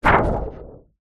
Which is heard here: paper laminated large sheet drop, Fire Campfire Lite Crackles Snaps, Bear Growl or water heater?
paper laminated large sheet drop